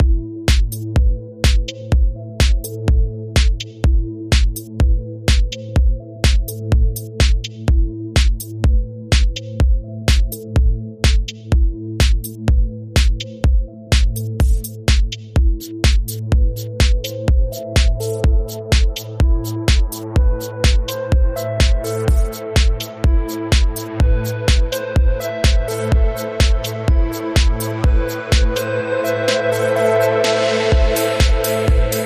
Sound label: Drums Lead Melody